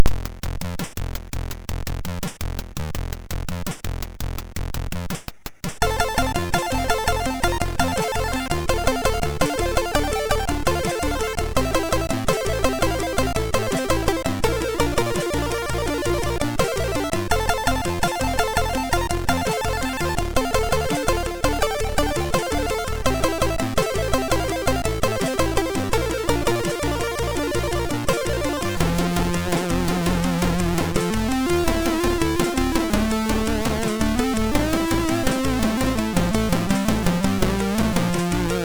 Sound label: sid MOS6581R2; Losing Light